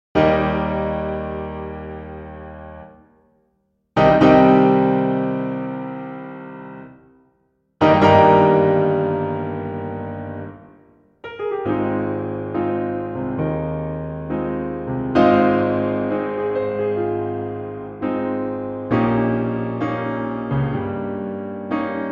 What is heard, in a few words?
Overture, 4 Hands Piano; Die Zauberflote (The Magic Flute); Ganz